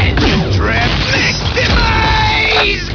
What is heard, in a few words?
pain; rattrap